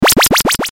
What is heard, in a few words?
flash bang